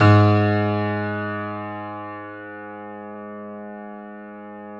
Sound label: PIA HIGH G, Grand